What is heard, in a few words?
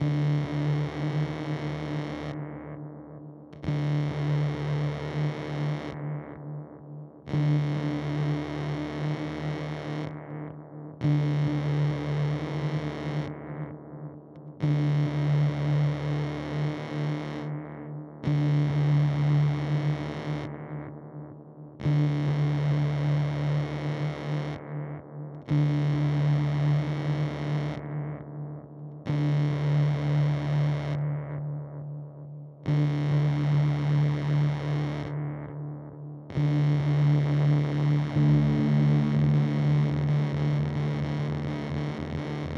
Pigtronix Constellator Space Rip